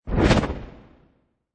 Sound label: Magical Whoosh Pan; Magic sound of casting spell, conjuring or wizardry. Witchcraft ritual summoning elemental or otherworldly creatur; Lynne Music